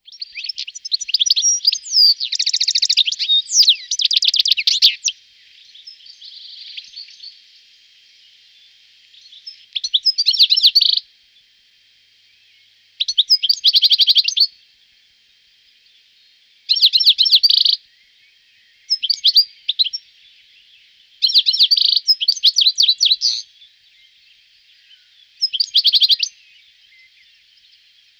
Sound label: Chardonneret - Goldfinch; Les oiseaux de nos jardins